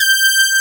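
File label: brass G4, SYNTHESIZER analog brass; AKAI S6000 CD-ROM - Volume 1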